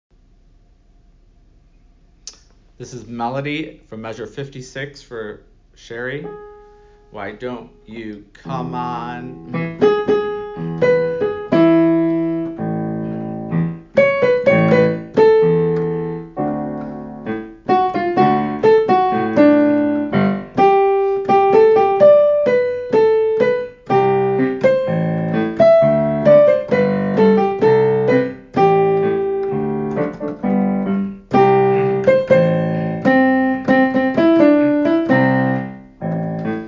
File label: MELODY